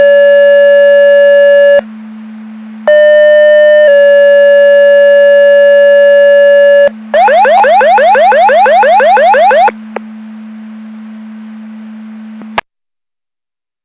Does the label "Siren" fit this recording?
Yes